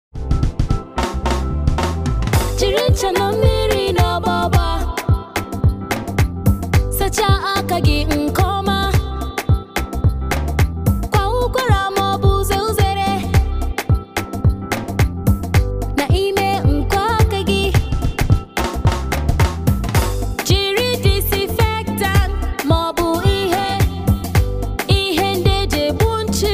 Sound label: Theme Song hygiene IGBO